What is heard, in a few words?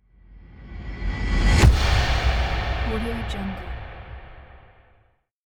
impact